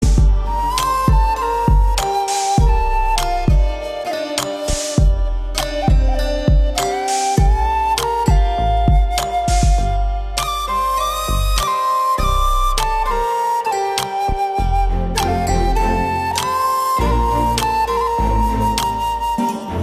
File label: Flute Ringtone